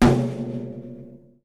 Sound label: tom double